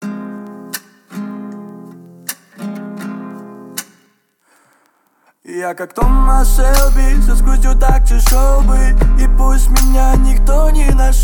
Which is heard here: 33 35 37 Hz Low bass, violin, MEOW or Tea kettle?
33 35 37 Hz Low bass